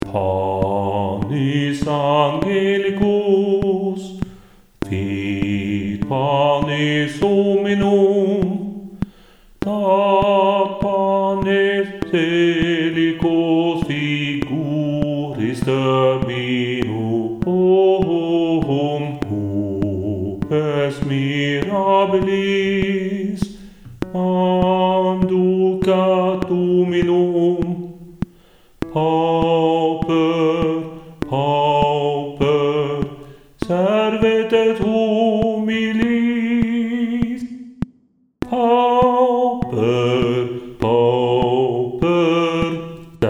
Panis Bass Click

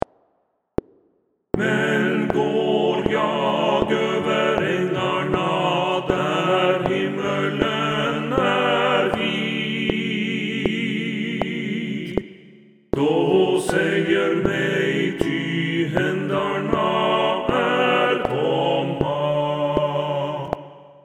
TUTTI PIANO

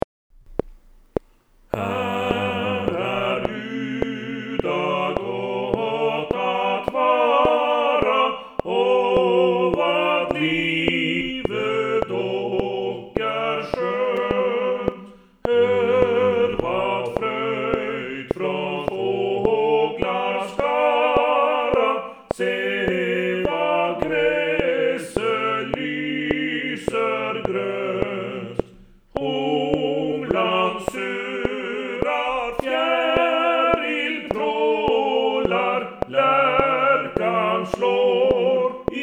TUTTI CLICK

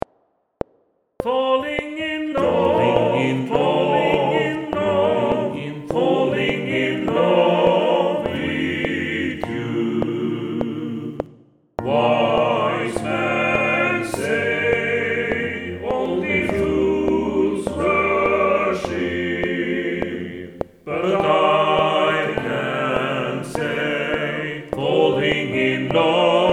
TUTTI

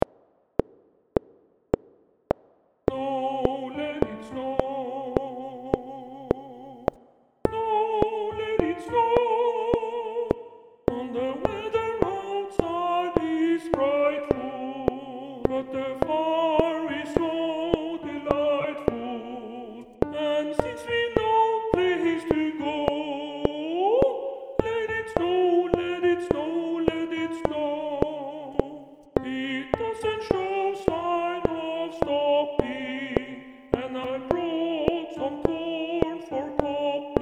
ALT CLICK

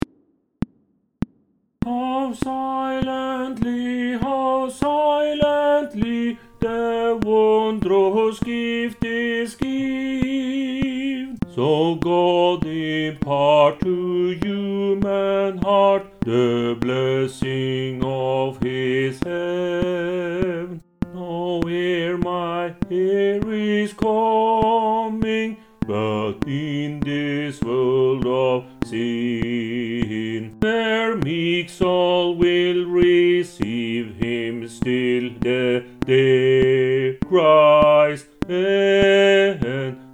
Bass click

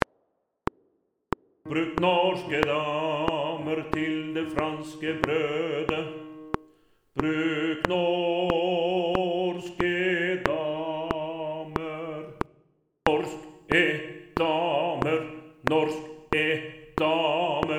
Bass 1

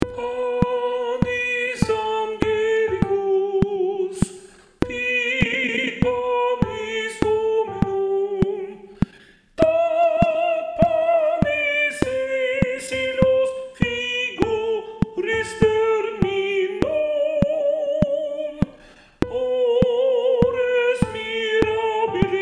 Panis Sopran Click

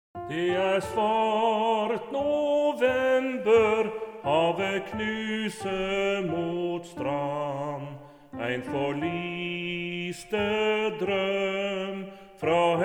TUTTI, lydspor; Tira Noir

